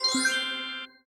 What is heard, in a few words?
shy; AvatarEmojiSticker Beyond